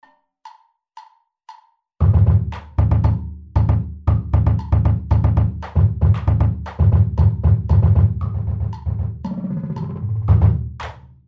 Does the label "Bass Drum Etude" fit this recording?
Yes